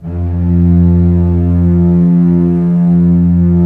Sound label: STR SEC, Roland LA Composer, Sec Mute St